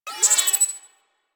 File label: Tech One Off, Ambience; Warframe; Rail Jack